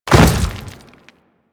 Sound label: Step Dirt, Footsteps; Warframe; Grineer